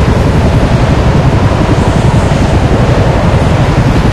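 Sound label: loud helicopter sweeten loop; vehicles; source engine; left4dead2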